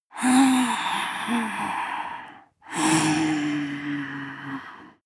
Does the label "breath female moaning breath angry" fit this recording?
Yes